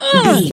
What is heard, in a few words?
voice female hurt pain